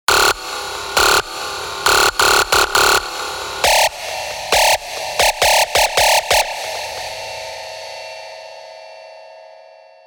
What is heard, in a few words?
Scream, big room techno drops; revealed serum 2 big room techno drops vol 1